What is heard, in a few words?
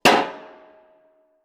Metal Strut; 99Sounds; The Warehouse